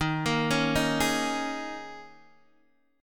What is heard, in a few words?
D sharp 7th Suspended 4th, Guitar, Open D